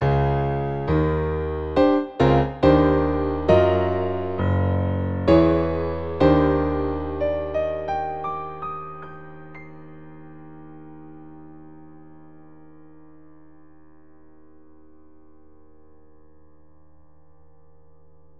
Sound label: Piano; The Legend of Zelda Ocarina of Time